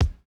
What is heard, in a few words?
kick, house